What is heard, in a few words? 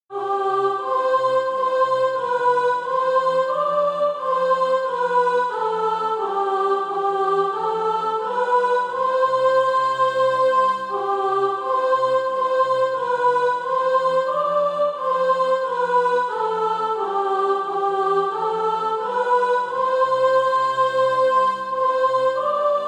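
soprano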